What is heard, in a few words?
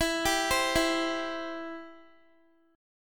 E-Minor Sharp 5th, Guitar; Em#5; arpeggiated; x,19,17,17,16,x